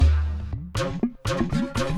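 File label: Music Base 120; From Dust; PS3; Xbox 360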